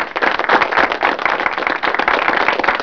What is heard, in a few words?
CLAP, 人群; 人群系列声音素材